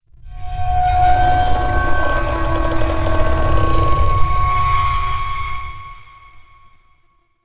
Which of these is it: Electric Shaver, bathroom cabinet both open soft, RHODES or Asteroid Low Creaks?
Asteroid Low Creaks